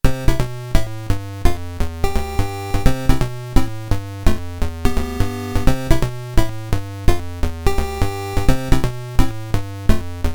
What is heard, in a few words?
Slot Machine; Penguin Adventure OST; Audio FlashJacks